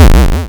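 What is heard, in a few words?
waveform FM square sine envelope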